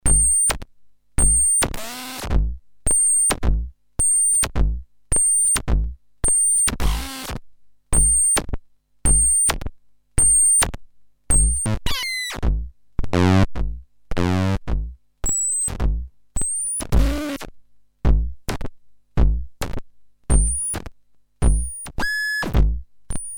Drum Sequenz; Landscape Noon

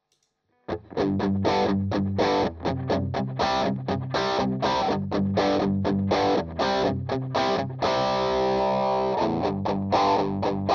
Filter

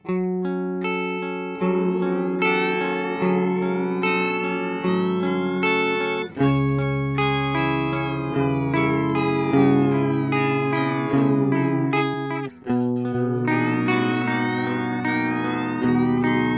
EHX Pico Pitch Fork Octave up max Attack

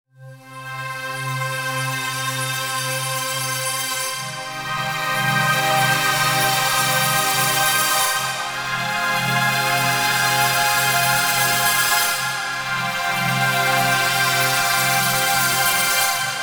Pad Granular

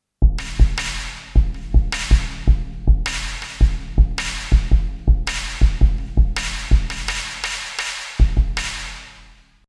Roland TM 2 Sustainer

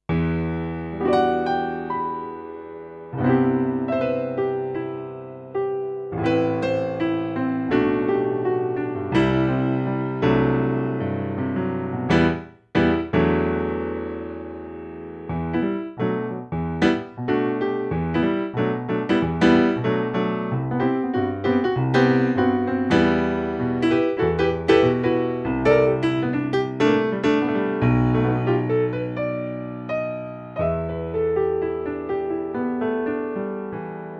J7 VIVO Grand

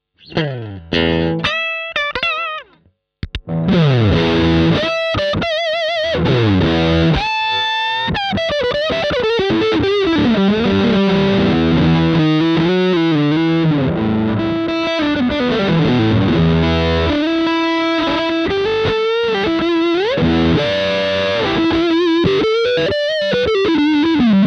Gain mid LEAD; BM20 ULTRA

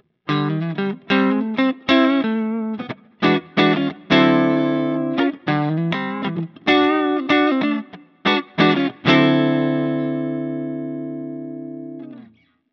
US Deluxe Singlecoil; Harley Benton DNAfx GiT Pro

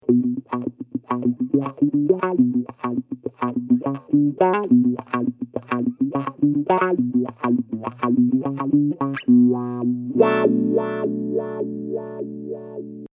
singlenote

